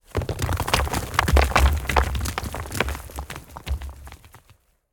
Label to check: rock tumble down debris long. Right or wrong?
Right